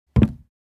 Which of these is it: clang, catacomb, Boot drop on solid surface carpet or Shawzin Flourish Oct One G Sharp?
Boot drop on solid surface carpet